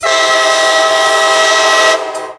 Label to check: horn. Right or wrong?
Right